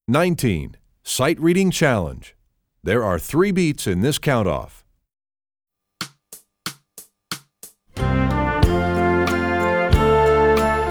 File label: Sightreading Challenge, trombone; essential elements